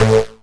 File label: Synth Hit A